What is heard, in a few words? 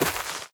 step light, footsteps player grass